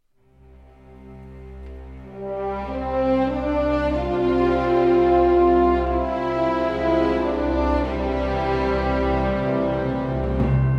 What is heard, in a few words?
cinematic orchestral themes